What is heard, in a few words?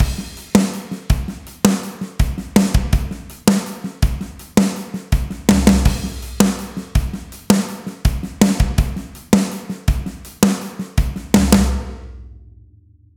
rock snare off; df xcite 2; drumforge